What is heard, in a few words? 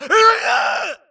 grunt electro; Titanfall 2